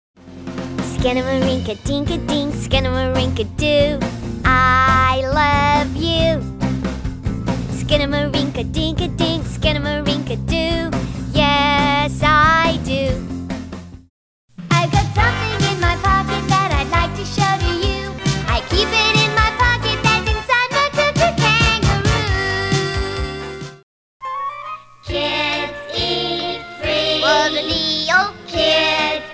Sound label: jingles